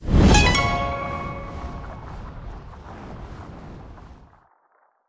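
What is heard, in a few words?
main small win; netent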